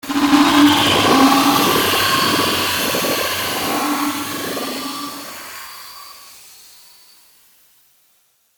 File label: Evil Laboratory Transition; fantasy sound effect; Andrew Fleming